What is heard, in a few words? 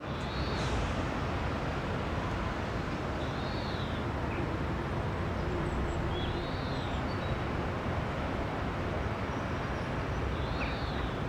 street ambience